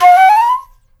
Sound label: Natural Flute FX, Jazz Solos and Sections, Traditional; Flute Loops; Sonic Foundry (Sony Creative Software)